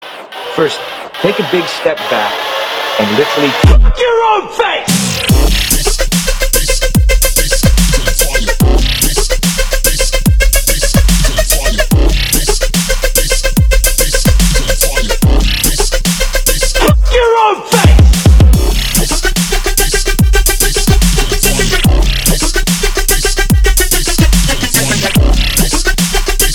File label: bass